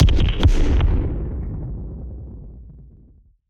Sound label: Cinema Sonic Boom